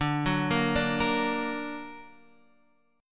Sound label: D Augmented D down Guitar Half Step Down